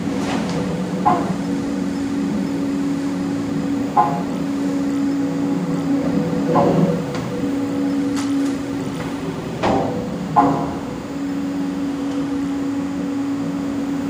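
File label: a subtle wrong humming noise, elevator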